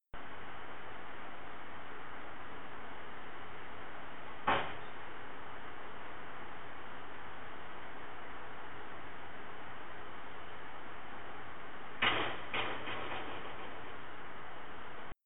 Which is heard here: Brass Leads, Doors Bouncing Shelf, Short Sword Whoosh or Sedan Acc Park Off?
Doors Bouncing Shelf